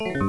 score DOWN; Spades Deluxe 2.1

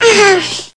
Ooh; HyperCard; FontAnim1

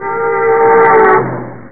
Car

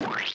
grass; The Interactive Alphabet

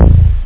bass

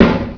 Snare Drum

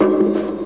Hit Bucket; Cloudz 1.0.3

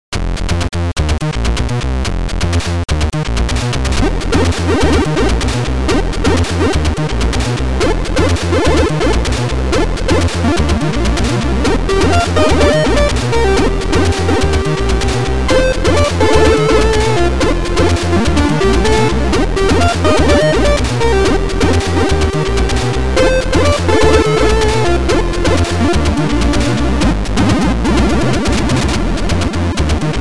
cloud

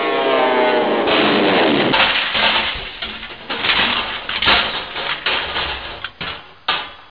AVIATOR

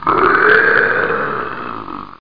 goblin growl; Ferazel's Wand Demo

